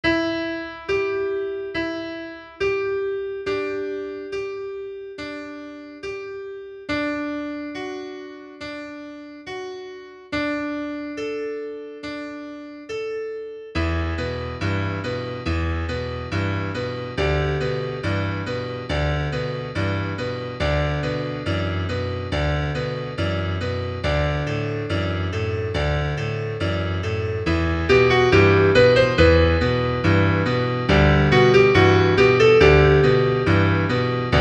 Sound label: comptine piano